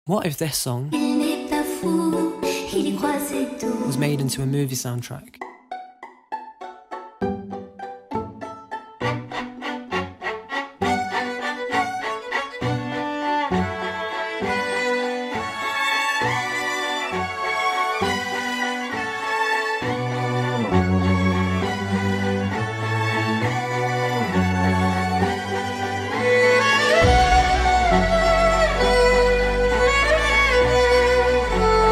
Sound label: dramatic violin version